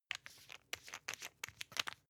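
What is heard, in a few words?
toothpaste close rattle, Bathroom; haus-garten; badezimmer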